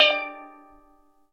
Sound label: steel drum; E-MU Producer Series Vol. 2; More Studio Essentials; Composer